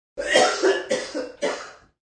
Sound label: Man Coughs, Male coughing sound; Sick